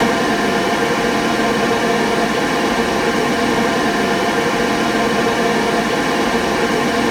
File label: Texture Loop, Techno